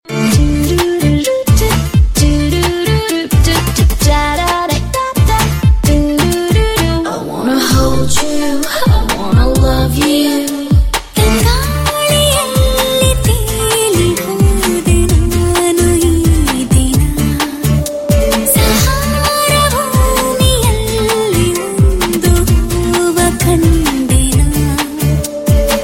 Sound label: Melody